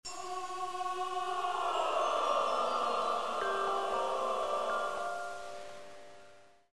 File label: Magic; dragon lore 2